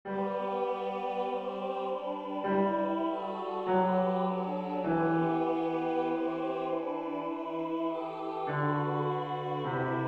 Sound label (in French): basse tutti